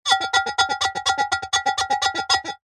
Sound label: glass jug thick finger rub squeaks fast